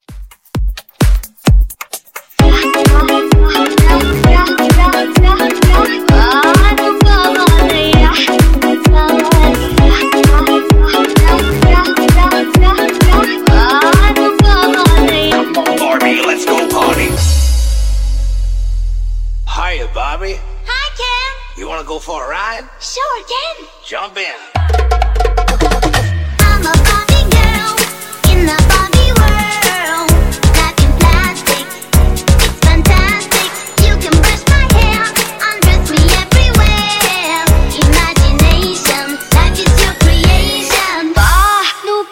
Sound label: Funk Dj Intro Outro 130 Bpm